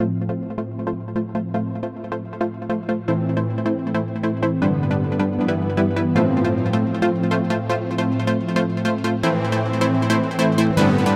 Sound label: dubstep